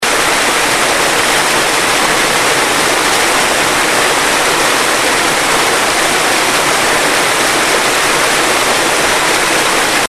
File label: Macedonia Gorge Falls